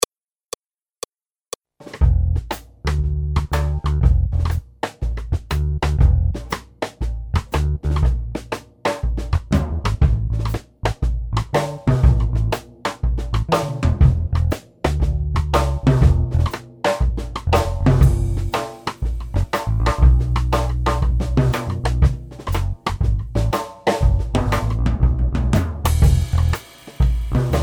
Left hand blues Accompagnement seul; Jazz

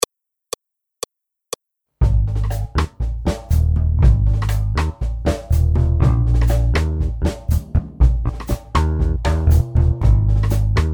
Accompagnement seul, piano; Jazz

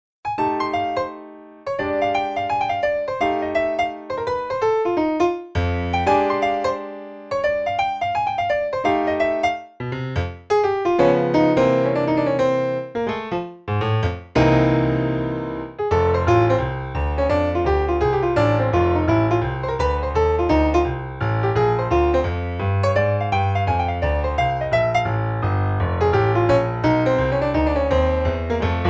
boogie blues Piano seul; Jazz

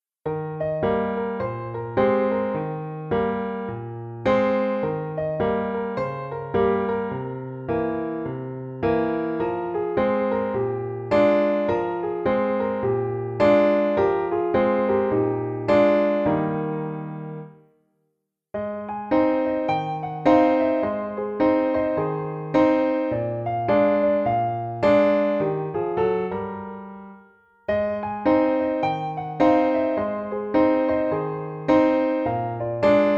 rag Piano seul; Jazz

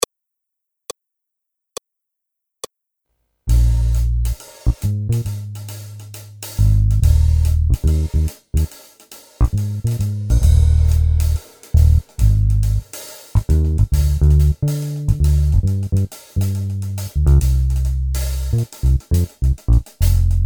blues Accompagnement seul; Jazz

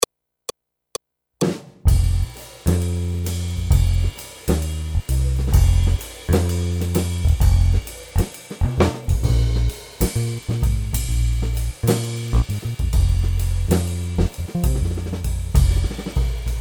Almost simple blues Accompagnement seul; Jazz

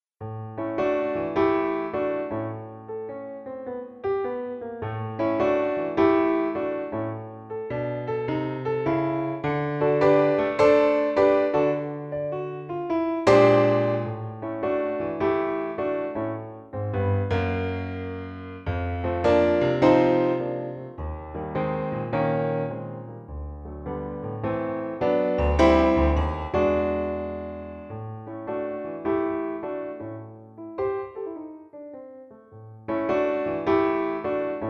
Happy blues Piano seul; Jazz

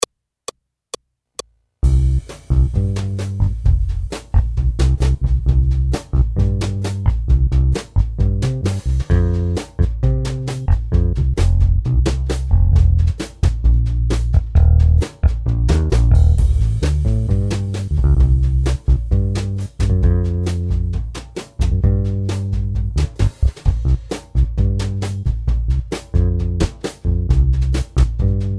bossa, Accompagnement seul

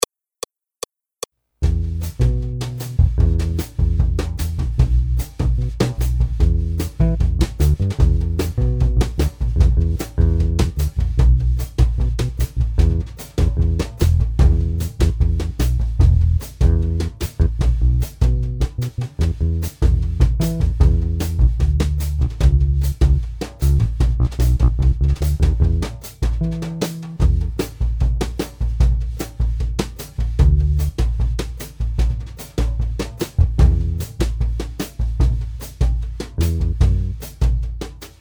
Accompagnement seul, piano; Jazz

